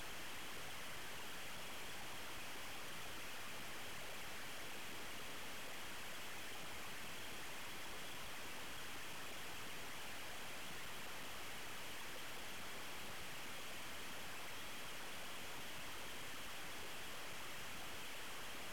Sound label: Milstar